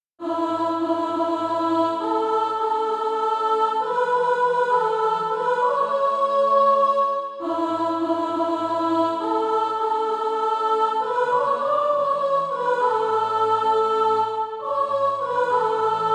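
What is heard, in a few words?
soprano, SATB Practise Music; Deeper Yet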